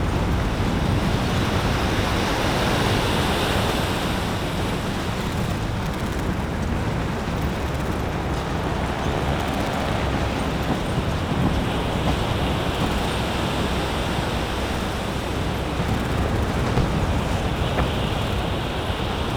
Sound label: ocean battle in distance; WAR